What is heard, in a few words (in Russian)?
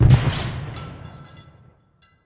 gluhoj zvuk vzriva s razletevshimisya oskolkami